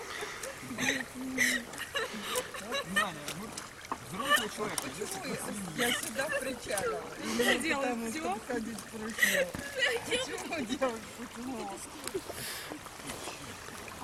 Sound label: dirt; wrangell